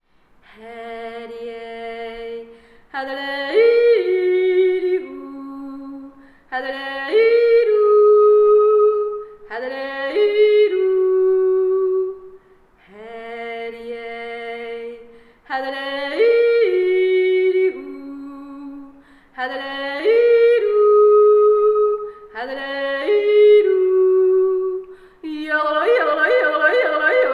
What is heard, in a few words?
Jodel